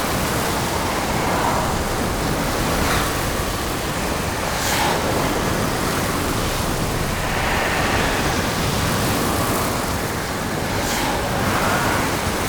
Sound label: Hazard Fracture Loop Fire; Warframe; Duviri; Undercroft Hazards